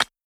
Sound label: clap air, Hip-Hop; Drum One Shots; SD CAICOS Hip-Hop Sample Pack; Slate